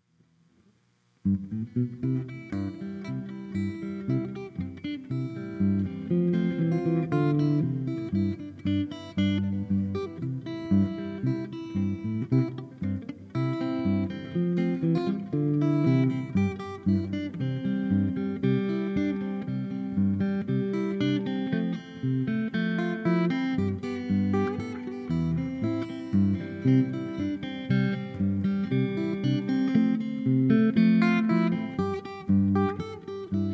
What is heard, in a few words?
jingle bells